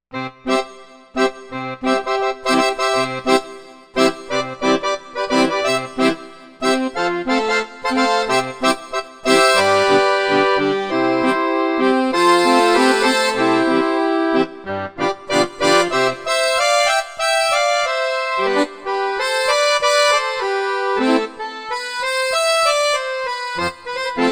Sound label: Polka Box